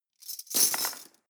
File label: cutlery teaspoons drawer place soft